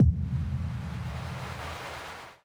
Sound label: ghost dialogue death initial white